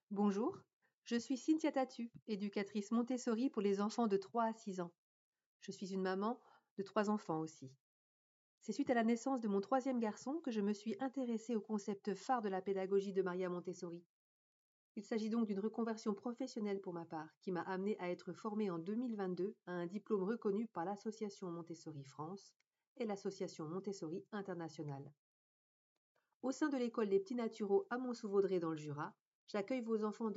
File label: Vocal